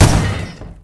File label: Common crash; Talking Tom Gold Run